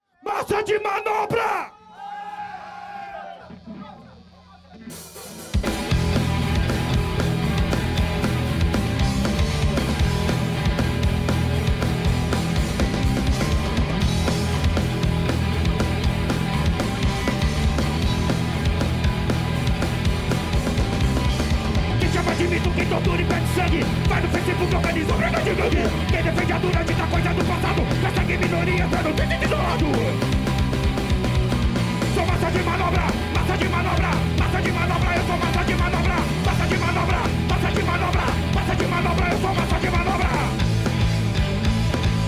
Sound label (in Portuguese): ao vivo no st paul s pub